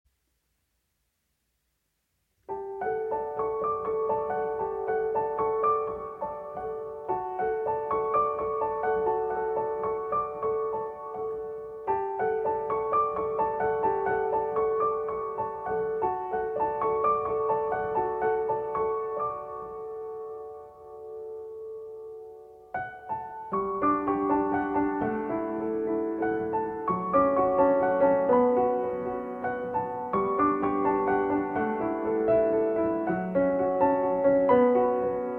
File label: Main Title Theme (Piano & Strings)